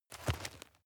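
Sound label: step dirt heavy boots soft, footsteps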